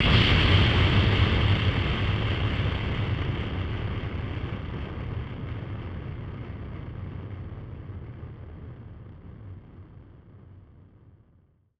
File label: Drum Bomb; musicradar; bomb factory; Drum Bombs